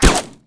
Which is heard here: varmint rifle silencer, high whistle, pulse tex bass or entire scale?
varmint rifle silencer